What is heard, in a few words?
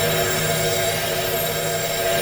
double attack loop; LoL; monkeyking